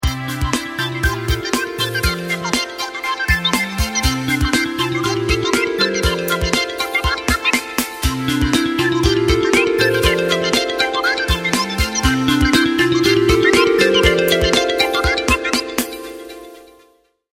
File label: Bubble Beat; Sony Ericsson W880i